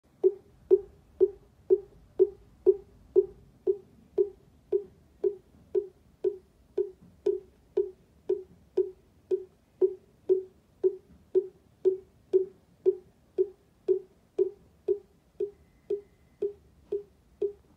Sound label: Wooden Fish